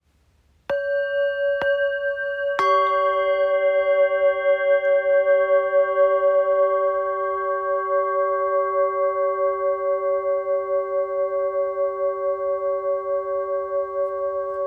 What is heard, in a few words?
Hanging bowls; a graceful set of three small suspended bowls; Halo trio hanging bowls; Moksha Energy